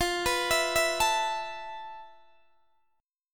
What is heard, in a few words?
F Major 7th Flat 5th, F, down, Guitar, Open D